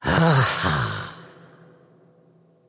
wolf attack emote; dota; crystalmaiden

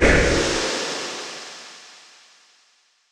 bramble cast; weapons; dota; dark willow

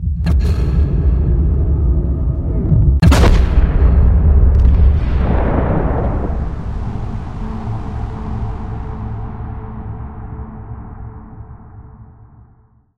takeover stinger; earthshaker; arcana

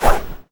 preattack; dota; bloodseeker

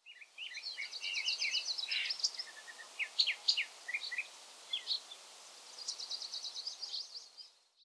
birds; dota

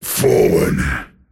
move pain; pudge

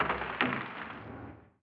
ice, ambient soundscapes; dota

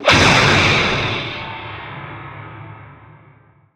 unstable current target; weapons; dota; razor

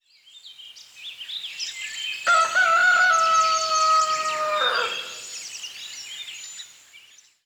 morning, ambient; dota

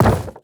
wood equip; inventory

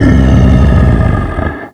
death golem granite; dota; creep deaths

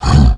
grunt, roshan; weapons; dota; creep

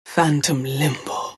death; dota; phantom assassin

